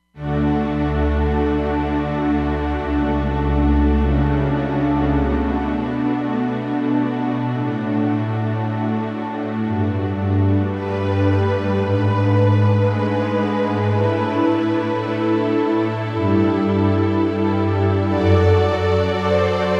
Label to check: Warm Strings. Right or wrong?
Right